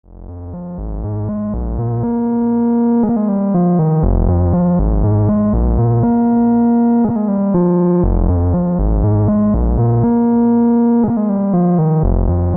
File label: Bass Synth